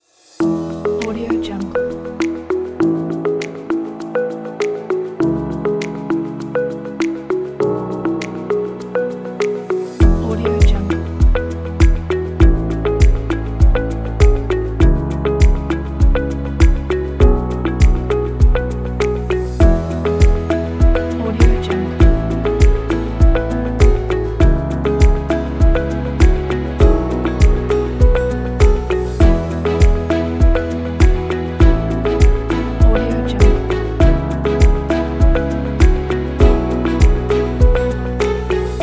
medical kit

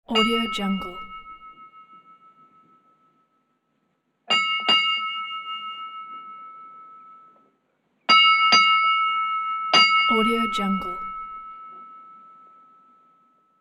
ships bell; sound-ideas

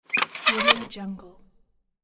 old trap door

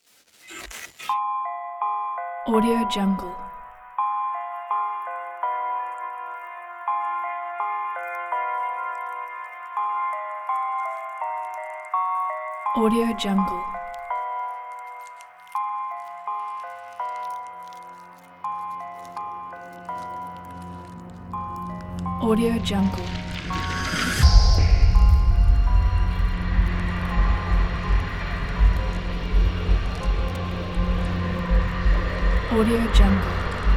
dark trailer

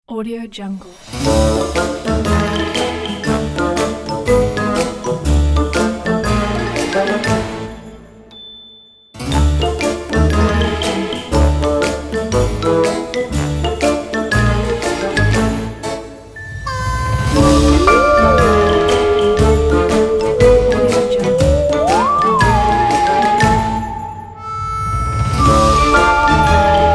halloween

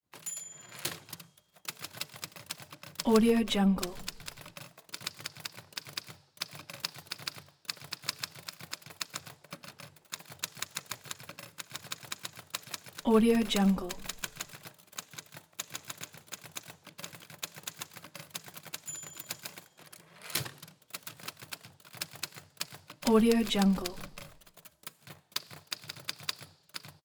old typewriter 1920s remington random typing; prosoundfx

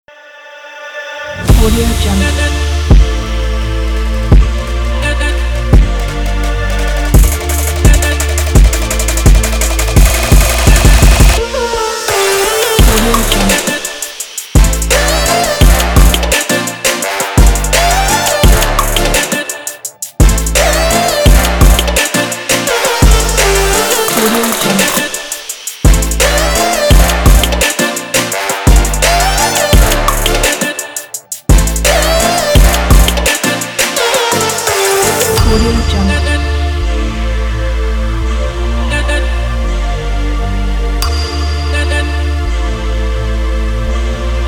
magic future bass; presence-illusion

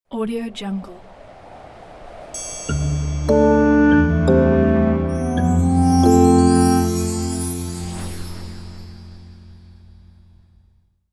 xmas intro

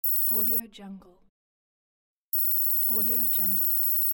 computer digital text; andrewsound83

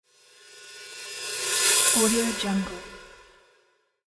cymbal whoosh

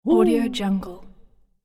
cartoon character woooo

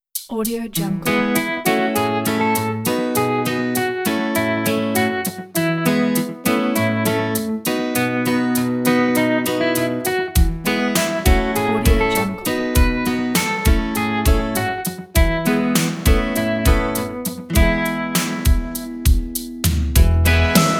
summer rain

